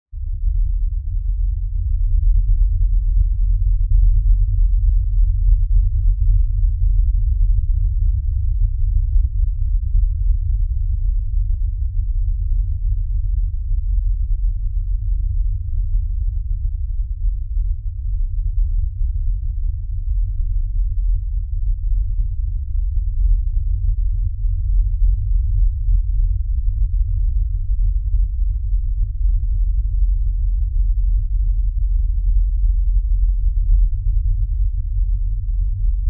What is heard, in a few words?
Impact Lfe Rumble; Impact Lfe Rumble Shake Bass Long; Low Impacts (Sound Effects:Impacts); Blastwave